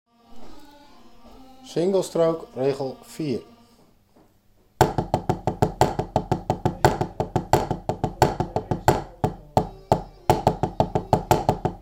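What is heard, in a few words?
Single stroke roll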